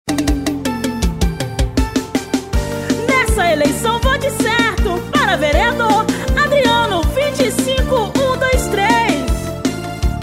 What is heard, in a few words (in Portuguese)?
jingle vereador prefeito eleicao campanha politica tecno melody